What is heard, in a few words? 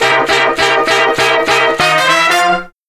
Horn Sect Effect; Effected; Sonic Foundry (Sony Creative Software); Jazz Solos and Sections